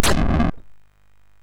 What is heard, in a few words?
Shuffle, MOS6581R2